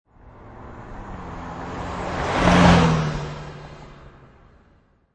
Mustang 68 Fastback Drive By; Cars; Lynne Music

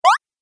Game App Jump, Video Game Sound Effects

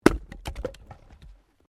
Wood Chopping Axe; Objects

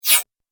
Short Fast Whoosh; Whoosh Sound Effects

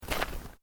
Footsteps Boots Road Gravel Step; Footstep Foley; Human

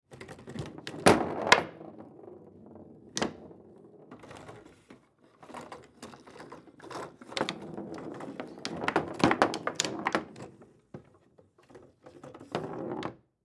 Foosball Table; Foosball Table Kick Ball Around; Games; Sports

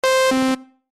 Chime Musical, Chime Musical Multimedia; Electronic; Web Interactive; Technology; Chimes; Blastwave

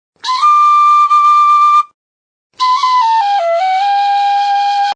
Short tin flute sting; Musical

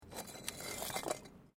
Bricks Movement Minor

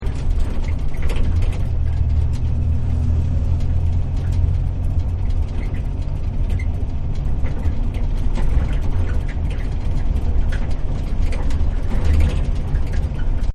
Bumby Ride, bus; Busses; Vehicles; Partners In Rhyme

